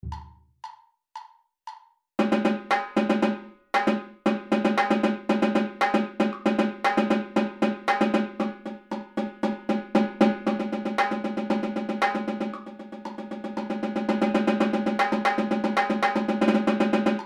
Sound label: Toms Aux Etude